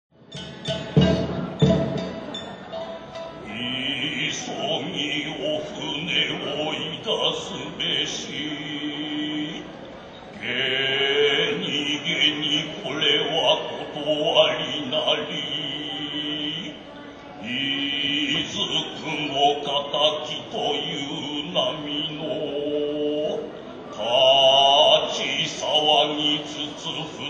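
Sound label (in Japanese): 人形囃子, からくり人形演技の際に演奏される; 人形囃子2; からくり人形(傀儡師)演技の際に演奏される。船弁慶の場面。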